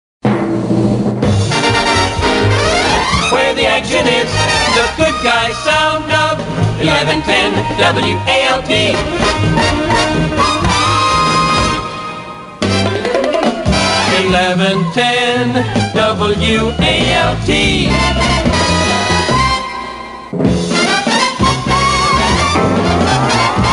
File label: jingle comp; mid60s